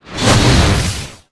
Chain Lighting

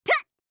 FEMALE attack

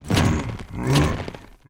BLAZE BLOCK PEACE WALK

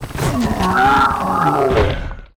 STONEBLOCK WAR DIE

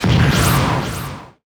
Sandstorm

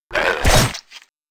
attack; SNATCHERHON; Mob

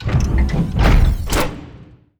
CATAPULT COMBAT DEAD

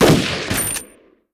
Rifle, Weapon

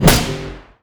Bthrow Metal, Damage

